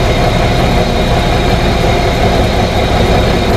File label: vacuum pump large; Electricity; EK modern